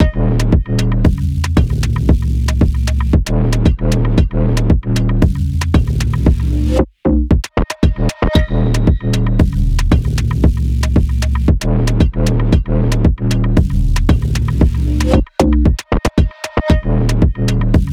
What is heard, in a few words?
Random Metal 115bpm; Felid